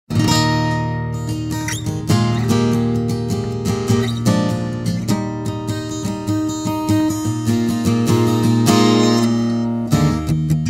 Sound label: - (Korean)